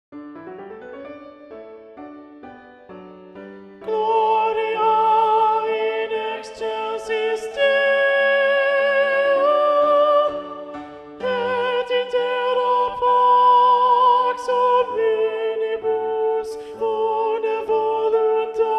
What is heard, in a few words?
Alto; Mass No2 in G Mvt 2 Gloria; Practice Room